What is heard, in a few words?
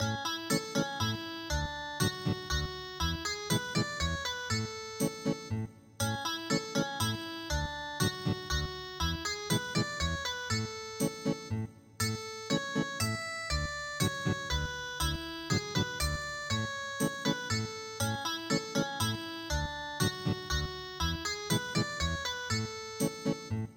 Ethnic Guitar, traditional